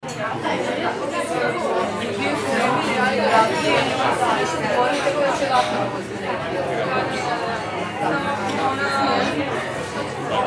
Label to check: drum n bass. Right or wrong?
Wrong